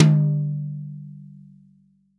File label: TOM, REAL DRUMS, SWING KIT; Best Service ProSamples vol.43; Real Drum Kits